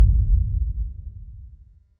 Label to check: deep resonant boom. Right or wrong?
Right